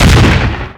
m24 chaffee 75mm

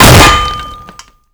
ap hit

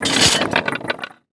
tiberium harvesting

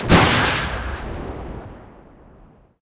attack bike fire; nod

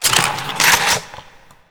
reload rifle; nod

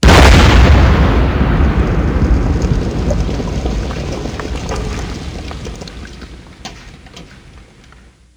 satchel charge explosion

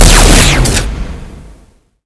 scorpion railgun fire

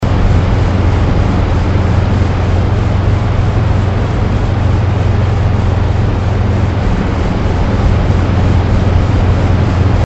predator idle; gdi

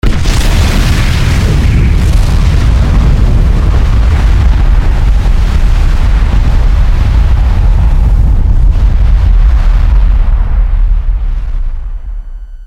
nuke strike fire

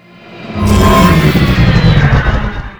tank stealth stop; nod

